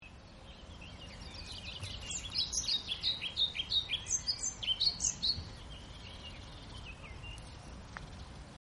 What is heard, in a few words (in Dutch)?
Bonte Vliegenvanger Ficedula hypoleuca Geluid; Annelies van Klinken